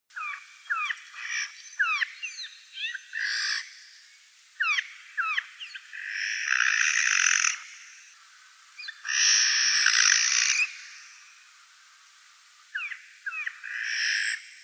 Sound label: Garrulus glandarius